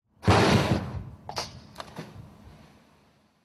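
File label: torpedo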